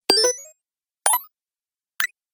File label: Magic Menu Button Clicks